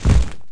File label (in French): pas terre; moth; WehikulCzasu; Time Machine; Bruitages